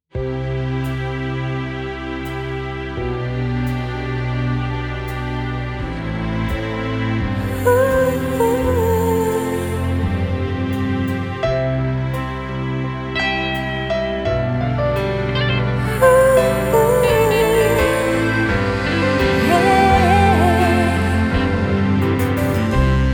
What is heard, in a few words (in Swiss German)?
Hymne auf Mundart